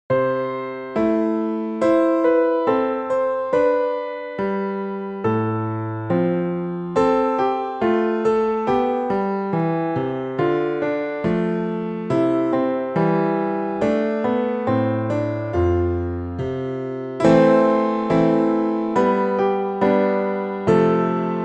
piece in C major

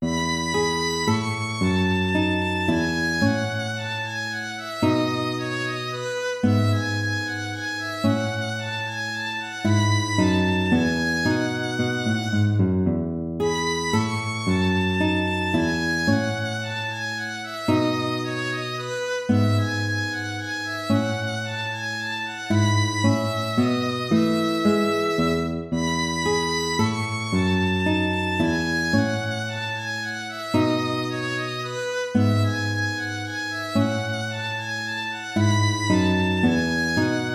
minuet major

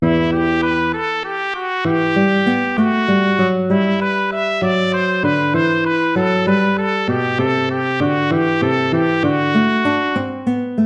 gigue

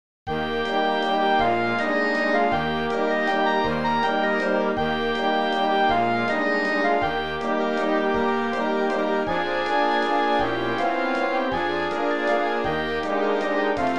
waltz; neola

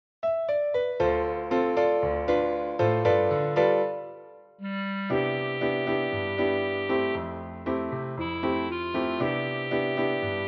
lazy bossa nova

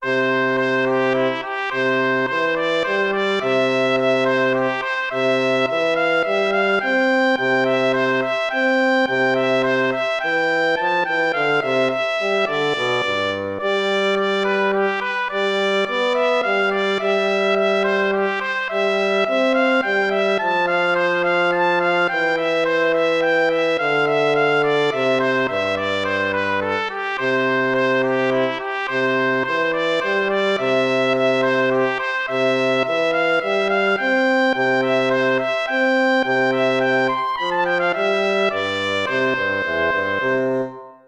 minuetto; hook james

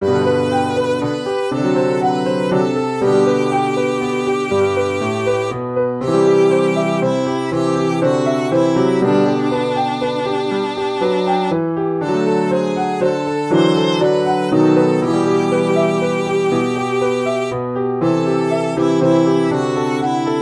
for viola piano; anonymous snow falls soft in the night

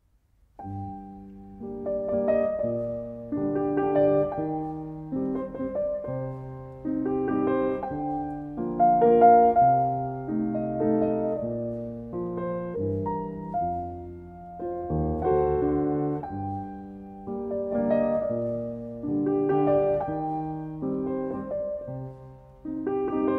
waltz concert arrangement; brahms johannes

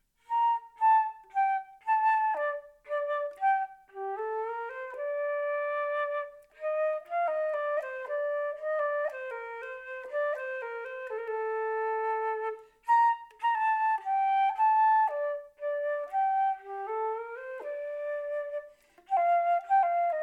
menuet; bach johann sebastian

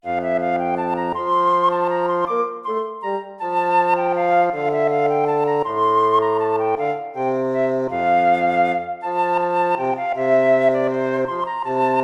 piece for lute

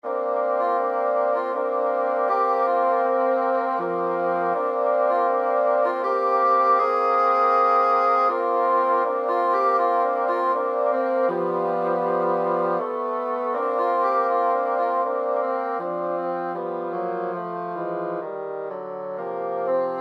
divertimento for three bassoons intermezzo

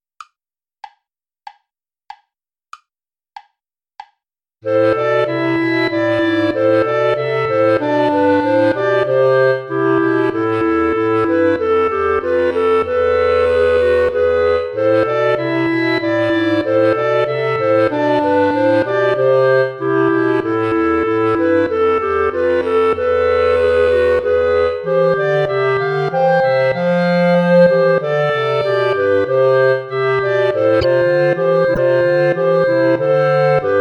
play along without clarinet

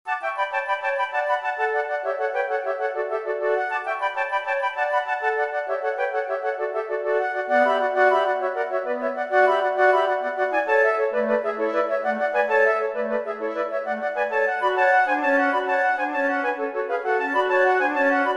presto for flute trio; Raff, Joachim; progressive etudes

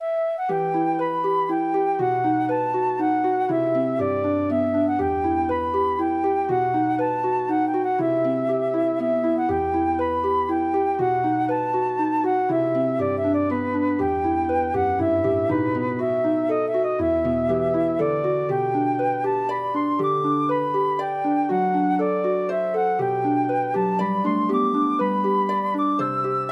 for flute oboe harp; a pretty maid milking the cow

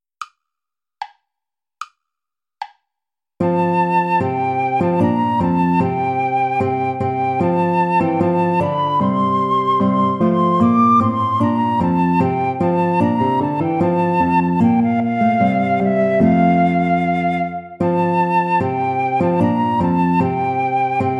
play along without guitar

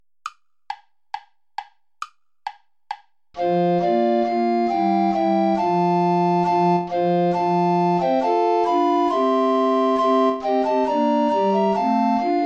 two dances play along without tenor recorder

